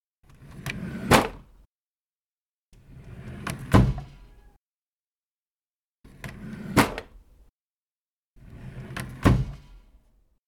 Drawer Open Close

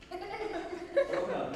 Laughing

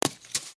Rock Hit

